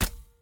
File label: crossbow